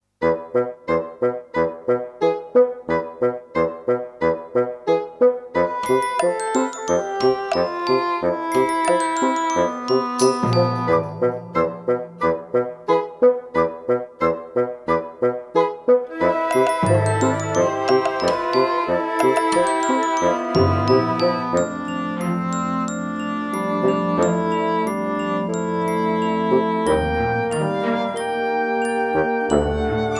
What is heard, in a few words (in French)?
MARCHE